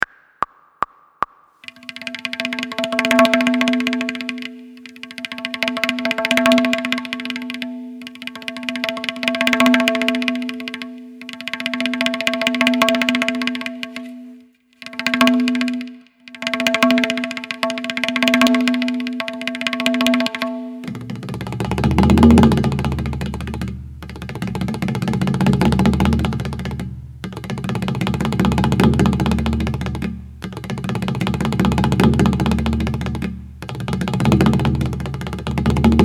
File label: Tremolos Practice